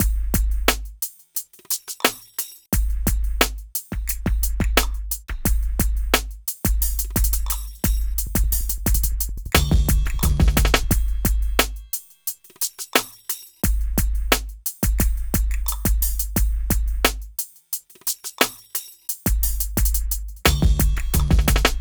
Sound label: Drums, Hip Hop; Sonic Foundry (Sony Creative Software); Lukecage Underground Soundlab Hip-Hop